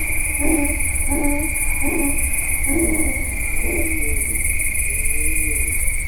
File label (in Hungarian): távolról vadászlesről, magyar parlagi szamár; allathangok; termeszetben; kozepcsala